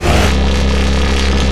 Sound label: shackle loop; weapons; dota; shadowshaman